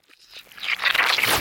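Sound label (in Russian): обратная запись звука рвотного позыва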